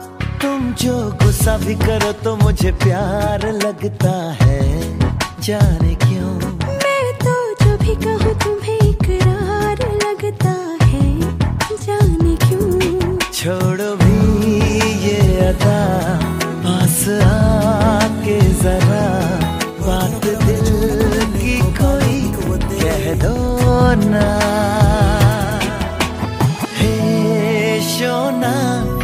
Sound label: Old Hindi Love Song Ringtone